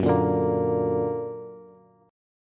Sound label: Gb Augmented Bass; Gb+ strummed